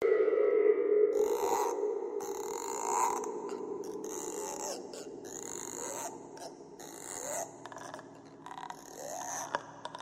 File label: Pond Echoes